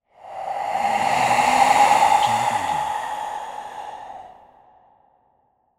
Monster Breath

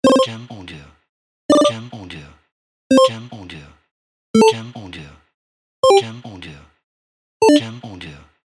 Game Sounds Set

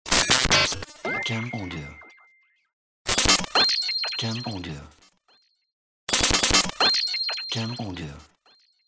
Glitch Tech

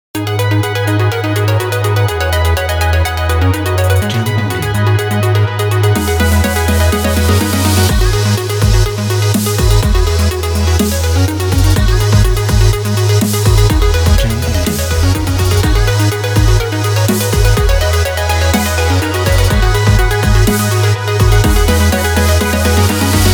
EDM Fashion Uplifting

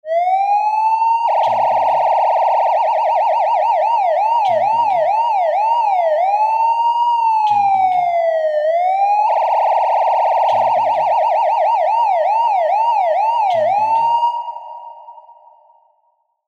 Police Alarm

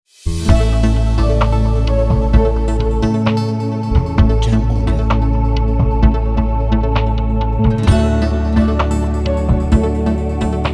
Electronic Ambient Intro

